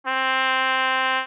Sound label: trumpet